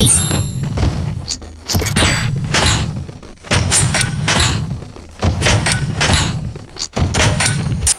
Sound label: heavy mechanical robot footsteps walking